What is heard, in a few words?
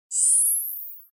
user interface zoom out